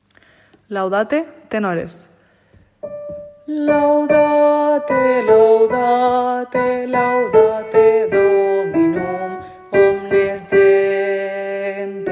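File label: tenor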